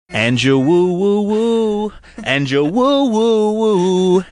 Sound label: singing and you woo woo woo